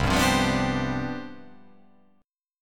C Major 7th Suspended 2nd Suspended 4th C down, chord